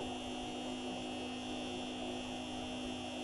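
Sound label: ambience neon sign; angel city; Titanfall 2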